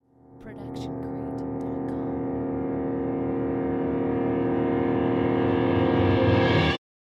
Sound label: horror reverse piano swell; soundscrate